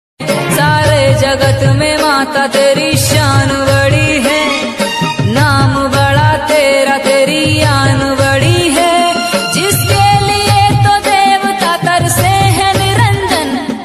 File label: bhakti ringtone durga mata ringtone hindi bhajan ringtone